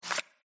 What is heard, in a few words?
card slide